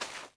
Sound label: fs sand, footsteps